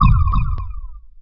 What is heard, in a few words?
underwater; Scars FNC SFX